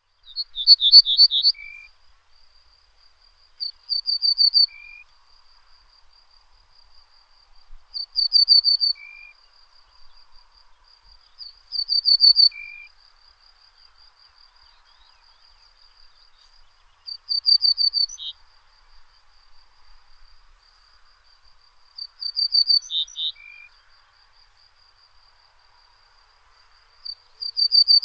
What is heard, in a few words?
Emberiza hortulana